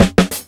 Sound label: DRMS, Underground Hip Hop; USB Soundscan vol.02; AKAI